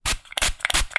household cooking oil spray spraying x3; zapsplat

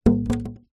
foley polystyrene piece long drop ground

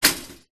household toaster press down

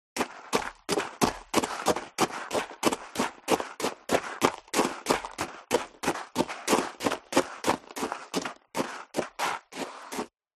FOLEY STEP High Heels Gravel Light Run Sequence; smartsound

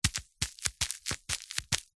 foley packaging soft taps; zapsplat

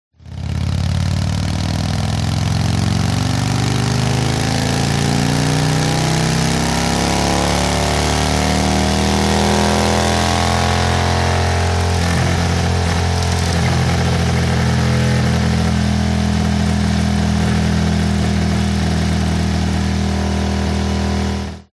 MOTORCYCLE Harley Accelerate Decelerate Long; TRANSPORTATION

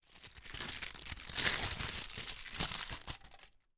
tin foil roll handling, household

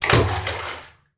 water splash flooded bunker; sound effects one

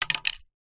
sport tennis racket set down concrete; zapsplat

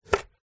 food lemon slice chop knife wooden chopping board

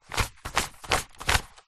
paper sheet thick hand rub brush remove debris; foley; zapsplat

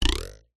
cartoon twang spring wooden ruler

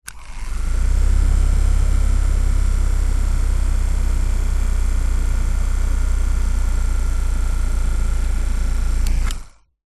household fan hand held plastic on run off short

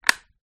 Light Switch

